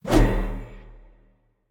swing; smite; baron samedi

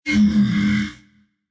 thinking; Smite; ymir v3 skin20

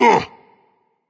ugh berserk; cuchulainn

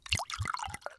liquid pour; smite; medusa

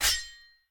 lance pull; smite; lancelot

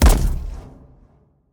footstep; smite; npc; grkconqs8 titan chaos; s8chaostitan

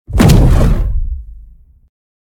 activate; smite; atlas skin3

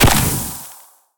crit; smite; olorun

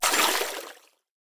passive waterbowl; smite; kuzenbo

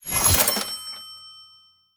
throw; smite; god sobek

